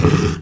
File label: uruk hai hit; spawners mobs